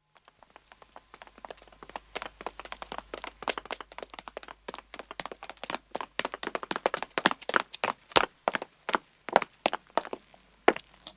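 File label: Footstep pavement run, 2 men approach and stop; BBC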